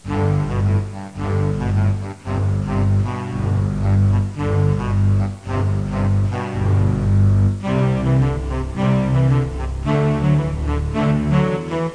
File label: New Song; Bible Fun Pack; Education Publishing Concepts; NOAH